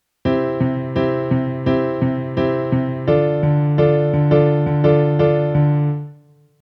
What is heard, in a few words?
passing normal